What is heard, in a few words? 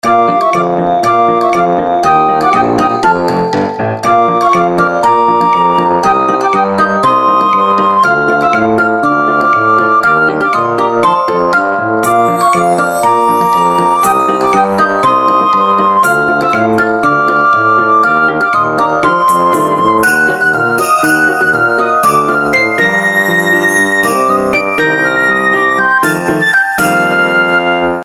Toy Factory